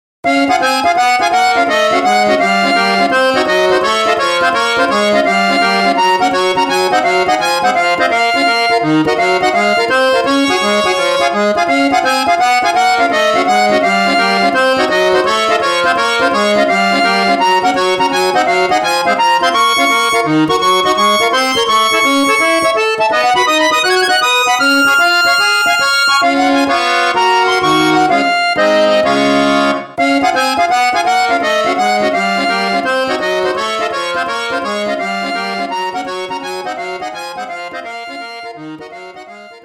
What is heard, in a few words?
bgm ethnic; maou